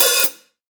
focused open high hat sound a key, Royality free open high-hat tuned to the A note